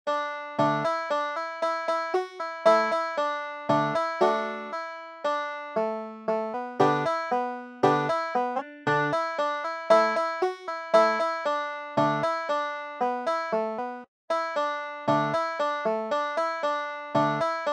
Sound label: sugar hill, banjo